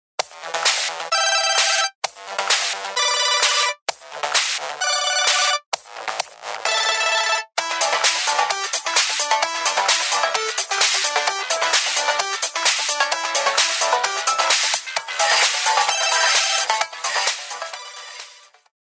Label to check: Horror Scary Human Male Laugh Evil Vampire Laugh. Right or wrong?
Wrong